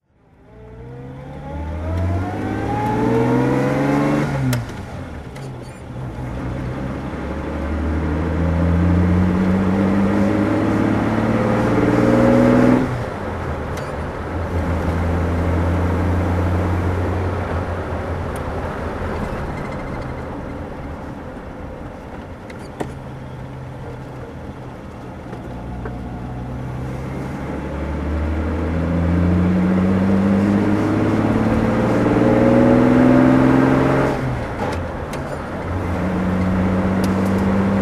citroen traction 15cv driving

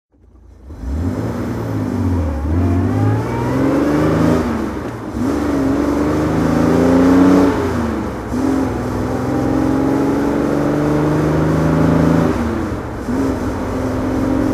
austin healey 3000 mk3 ph1 drive

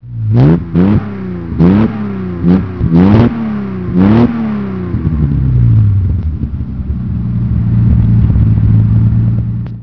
etype

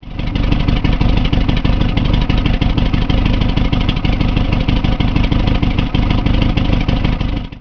stat

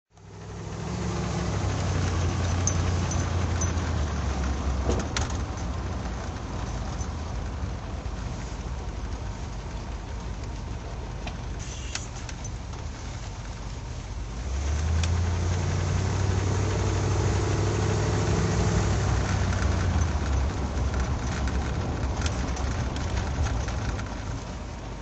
Facel Vega Facel 2 drive

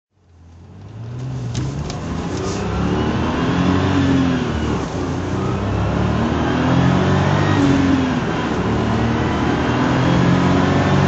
porsche 911 993 turbo s drive; Porsche 911/993 Turbo S, 1997; Engine sound driving; V3D/ ClassicarImages

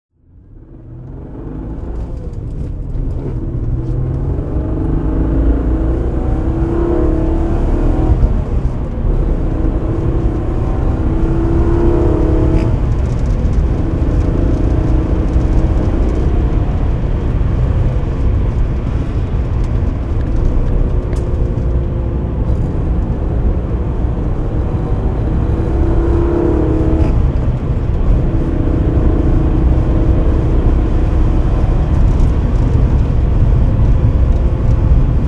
alfa romeo sz driving

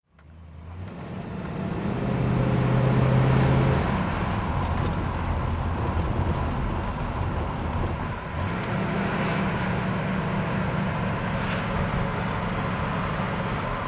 facel vega hk500 auto driving

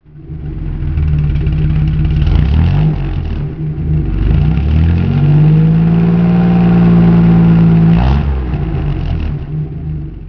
alvis

